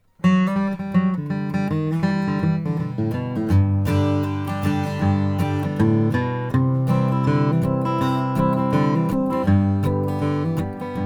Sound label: collings d2ha adirondack top